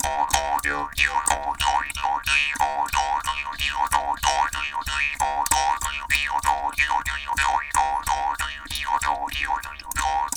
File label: JAW D 93BPM, Didgeridoo and Other Primitive Instruments